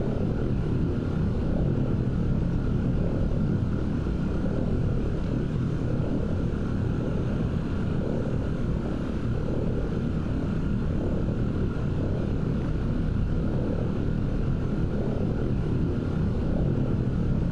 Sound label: ship transport ship idle; Stellaris; machineage; ships